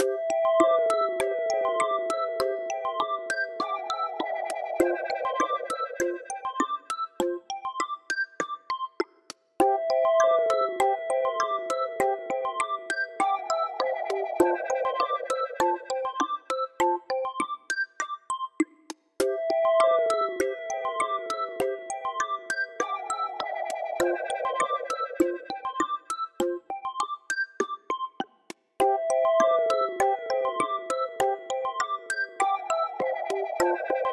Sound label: Tropical Fish, ringtones